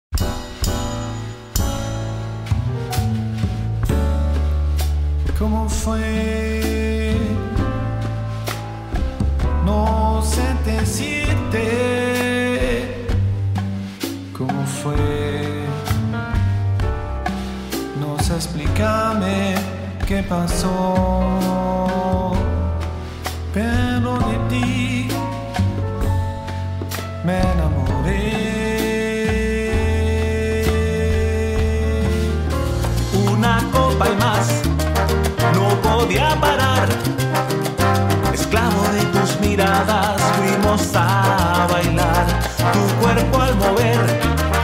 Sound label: Mix and Mastering Salsa